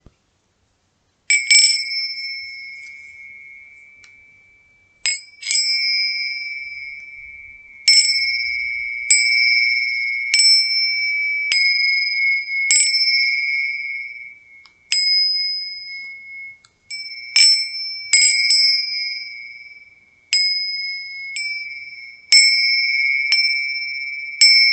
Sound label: tingsha green